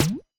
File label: jumper snare; nova sound